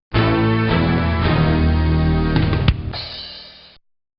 old game over

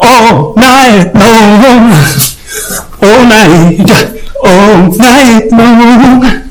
earrape

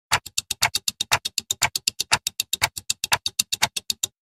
click

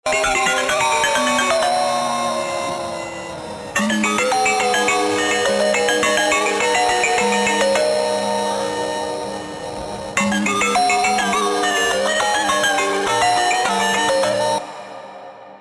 ringtone; polish

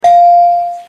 bell; wild n out

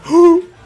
gasp; dank

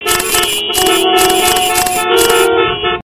traffic

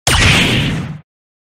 meteor smash

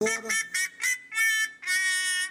kazoo

